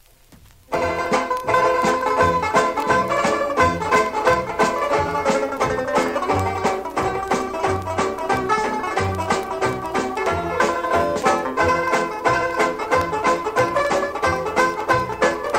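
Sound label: martin orchestra uke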